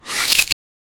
shield damage blocked; defense

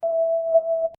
a success sound, notification

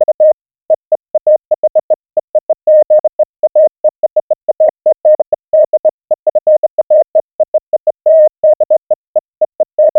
message

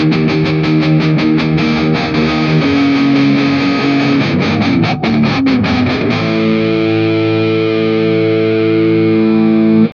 a heavy electric guitar

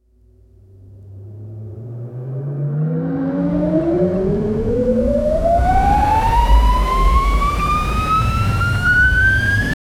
building energy charge; power up

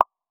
ui sfx of a item, inventory

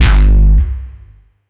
punchy electric bass drum

